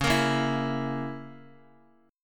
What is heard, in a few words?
Db Minor 6th Db down Guitar; Dbm6 strummed